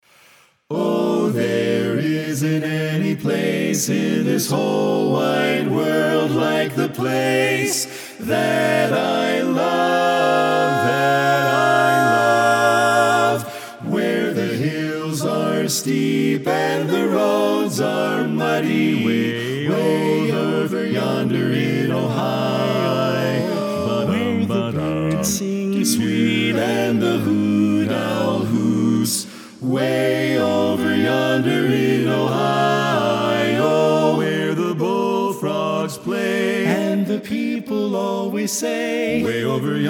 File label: Bass Left